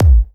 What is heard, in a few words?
One Shot Kick, Drum N Bass - Percussion; Sonic Foundry (Sony Creative Software); Knutrix Drum & Bass